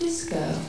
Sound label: disco